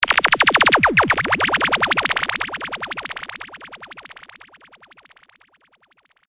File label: gunshot